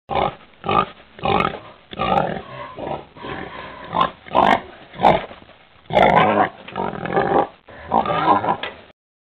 oinking

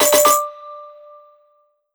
green light sound

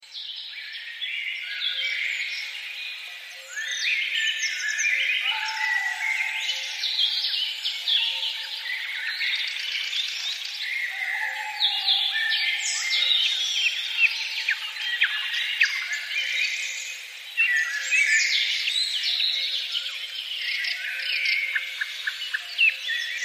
forest sound

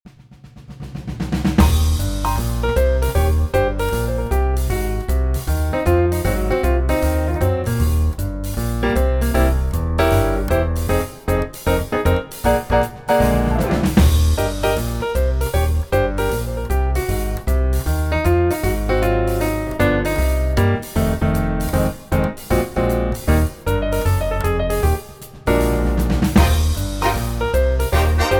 swing

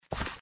walk backward

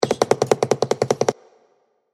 running

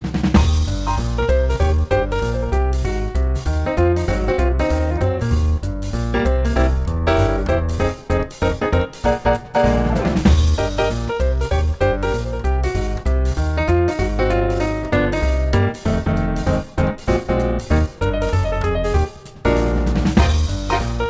swing

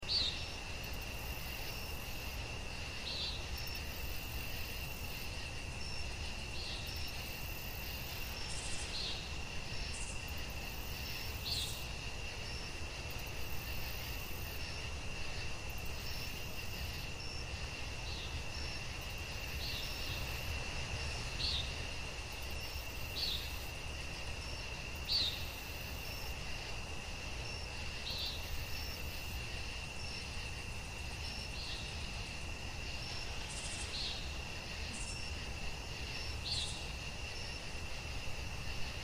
night sound

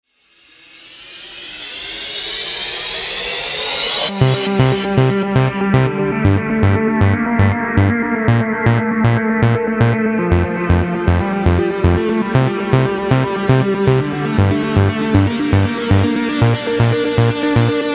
trance

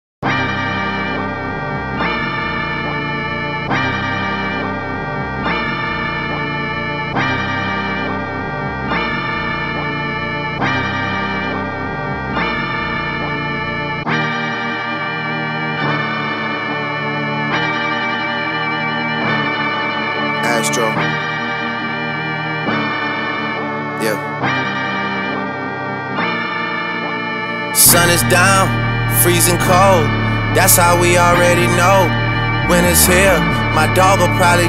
music

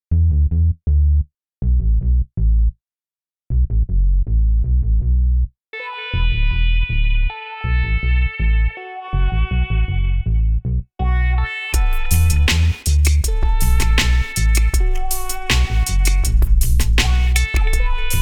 reggae

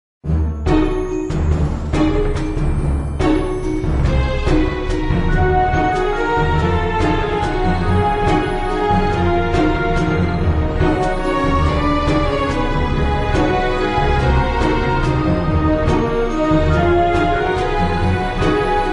soundtrack

